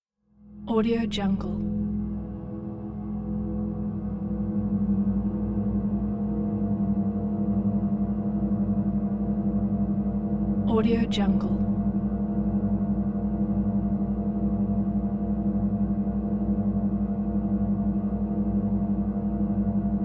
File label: eerie cymbal drone; hollywoodedge